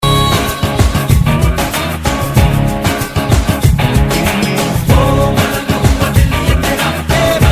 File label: rock guitar; ringtones